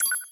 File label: click, sound theme; yorha